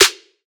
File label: clap blue, Hip-Hop; Drum One Shots; SD CAICOS Hip-Hop Sample Pack; Slate